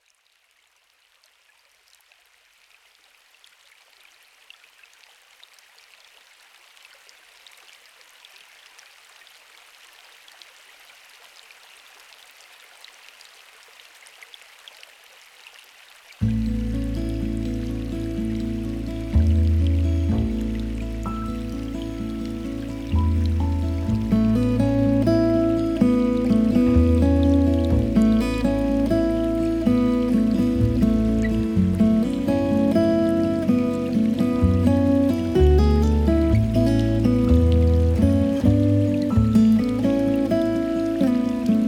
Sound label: Quiet Rain